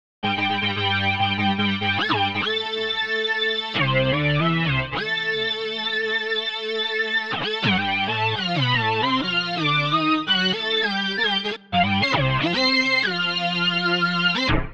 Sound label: Ultra Analog VA3